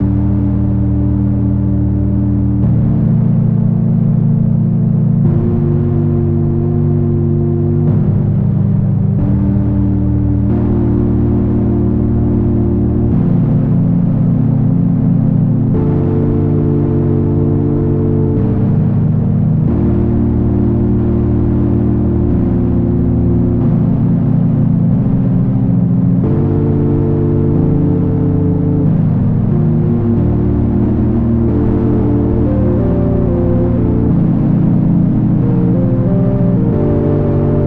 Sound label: 183BPM